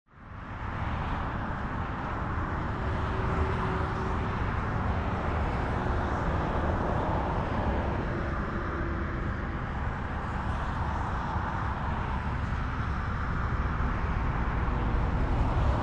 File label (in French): Dardilly autoroute